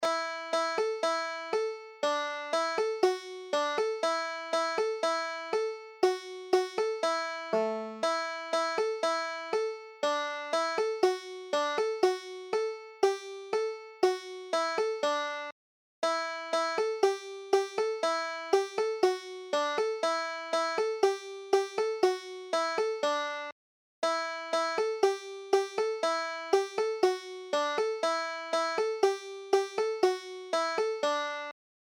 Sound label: rye straw, banjo